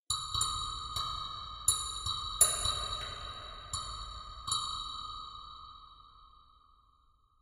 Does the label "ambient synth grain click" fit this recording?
Yes